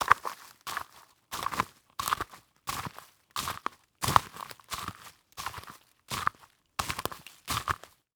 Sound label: Foley Footstep High Heels Leaves And Twigs Debris Walking; Foley Footsteps